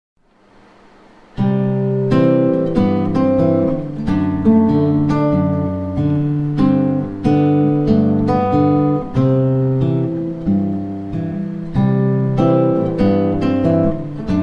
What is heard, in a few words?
fingerstyle, guitar